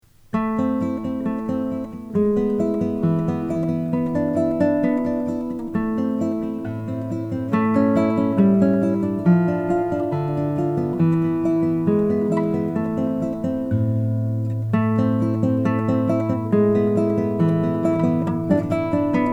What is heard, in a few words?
langejans brazilian rosewood